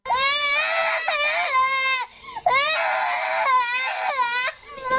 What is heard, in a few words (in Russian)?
rebnok ryidaet isterika